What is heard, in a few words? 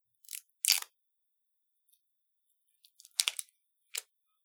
Jar Tearing Plastic Seal; Andrew Fleming